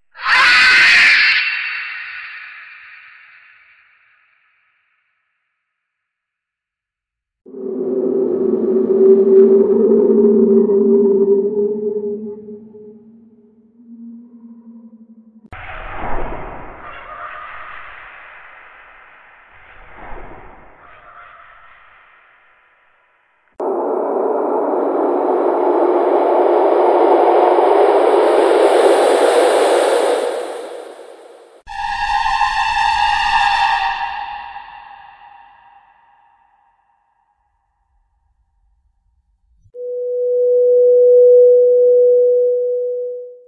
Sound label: creepy sounds effetti sonori horror creepy scary sound horror suoni spaventosi